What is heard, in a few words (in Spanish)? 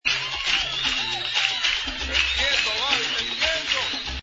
clave